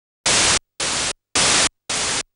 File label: noise 6dB two step; dBsteps 6dB